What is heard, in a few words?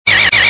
buzz